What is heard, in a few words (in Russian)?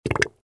puk cheloveka; Издавание человеком неприличных, но естественных звуков портящих воздух